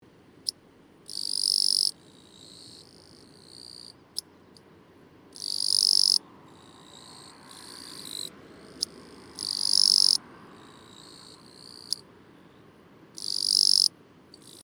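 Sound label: cicada kirigirisu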